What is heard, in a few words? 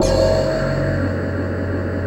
F#1, IND BAMBOO